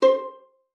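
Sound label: violin pizzicato C5; Xsample VOL-5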